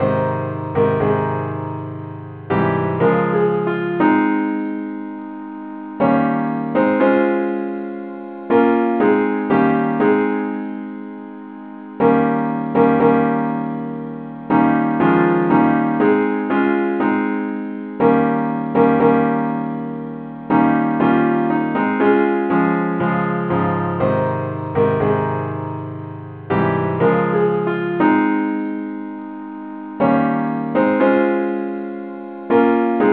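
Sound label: simple accompaniment, key a flat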